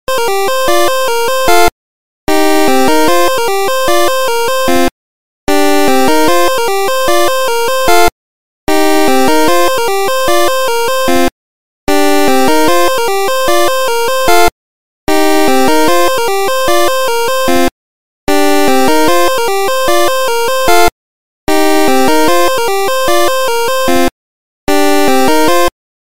8-bit iPhone

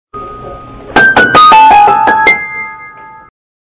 Creepy Suspense Tone

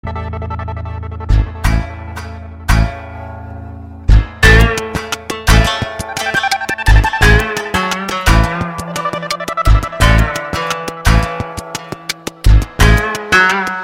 Guitar Sms

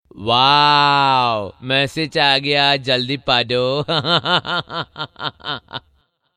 Message Arrived

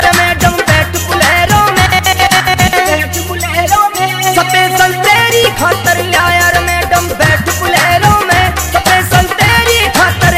Spacial Tone

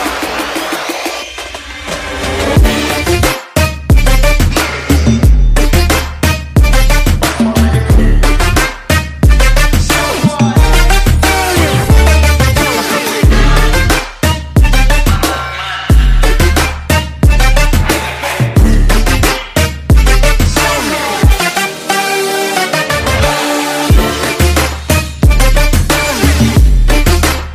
Arab Trap

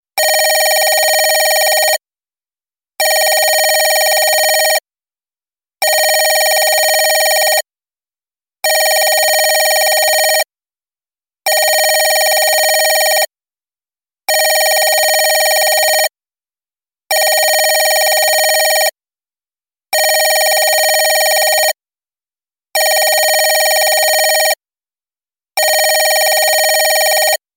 Different old phone

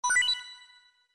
Drop Item; MGS

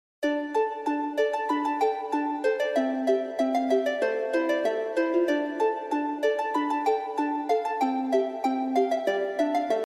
celtic sms

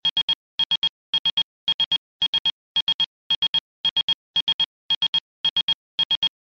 Message Tone

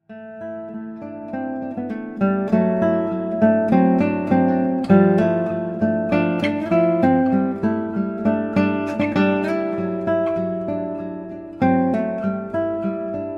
Guitar

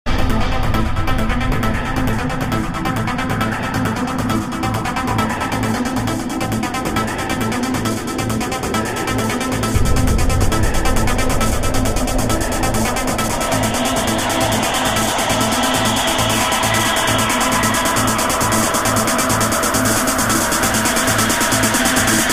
Trance Mix

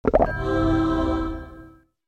Potion; Bellato

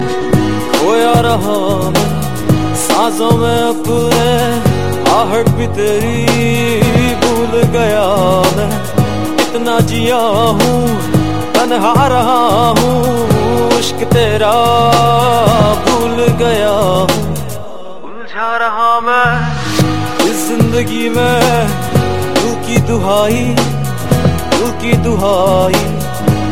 Unplugged

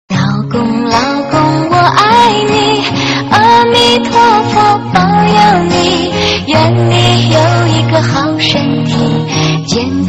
Gong; Lao

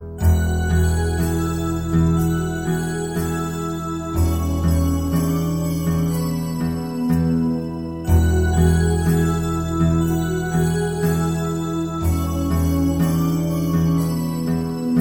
Chords

